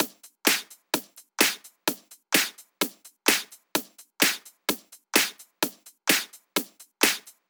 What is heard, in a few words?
128BPM Drums; Sunday; Vengeance Samples; Sunday Kit